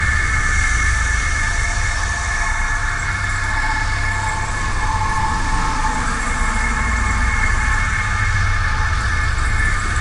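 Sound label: node exist loop; smite; heimdallr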